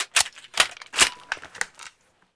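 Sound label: Weapon cowboy rifle jam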